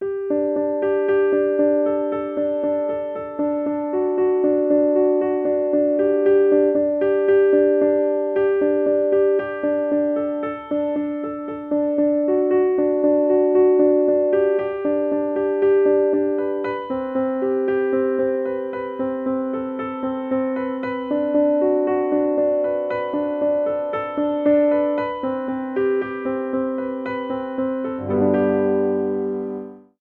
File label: solo piano; piano poems b